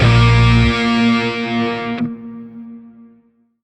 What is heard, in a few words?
Shawzin Hirajoshi Chord K; Warframe; Grineer Shawzin